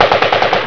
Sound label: AUTOMAT; WEAPONS